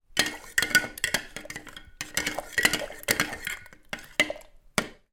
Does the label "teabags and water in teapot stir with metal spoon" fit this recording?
Yes